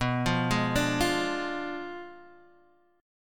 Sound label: B Diminished 7th B Guitar Half Step Down